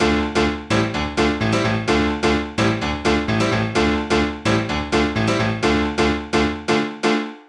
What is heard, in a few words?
128BPM Brazil Melody; Vengeance Samples; Brazil 2 Kit